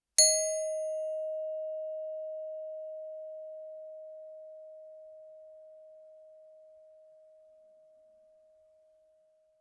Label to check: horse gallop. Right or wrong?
Wrong